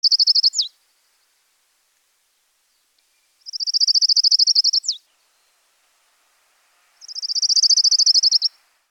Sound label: Colima Warbler